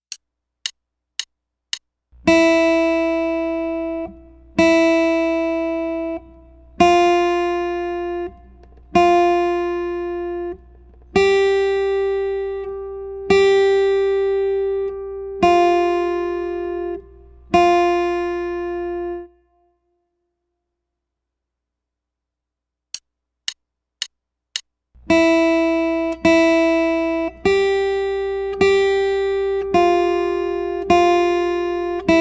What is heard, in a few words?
E Whole Notes Half Quarter